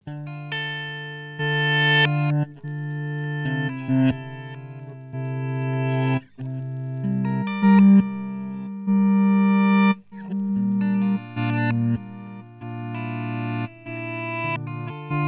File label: Rewind Reverse; Alexander Pedals